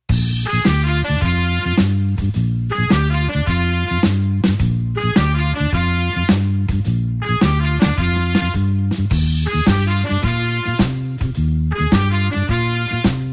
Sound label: blues horns shuffle Bb